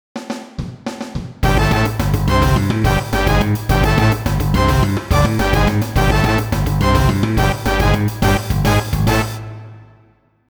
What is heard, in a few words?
Cheesy Intro Music